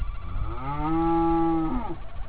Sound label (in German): muh; ostfriesland